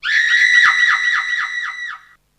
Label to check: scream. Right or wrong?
Right